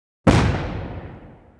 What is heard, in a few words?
cannon fire, T72; Distributed interactive simulation